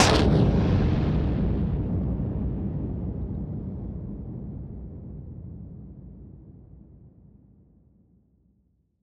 Noise Bomb; musicradar; bomb factory

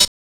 snare+hat; DRUM LIBRARY; DRUMS

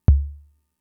kick, Fricke MFB-522

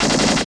machine gun; Total Control - Action Cutz

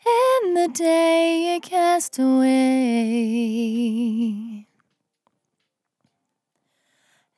On A Star 65bpm F, Vocal; Zero-G

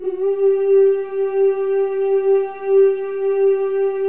FEM, Symphony of Voices; Spectrasonics

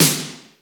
D70 SD, D70 DRUMS; Crypton - DrumBase5000

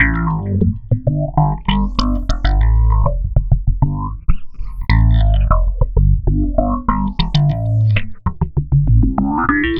Processed Slap Bass, Electric Bass

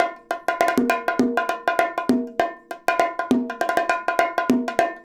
BONG, BONGO 100, Modern Percussion Loops

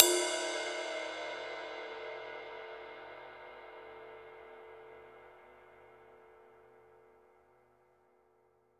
RIDE, Drum Dry; East Collexion; Drum 1 Dry

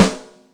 Blu Rim, Yamaha DTXpress Acoustic Snare

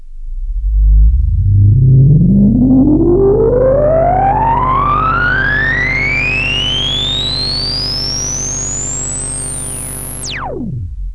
big res sweep, spacecraft; Zero-G Ambient 1

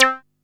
SHORTY B C3; Best Service Alpha Dance vol.2; SHORTY SEQ

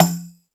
Jungel Percussion, E-MU Pro-Cussion; drum machine

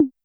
Tom Analog Soft, YAMAHA RM1X

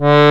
ACCORD D2, Accordions; E-MU Formula 4000 Series Vol. 4; Earth Tones

